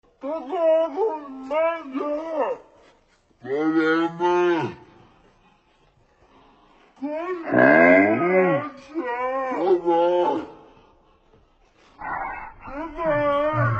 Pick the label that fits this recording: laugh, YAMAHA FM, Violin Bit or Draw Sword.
laugh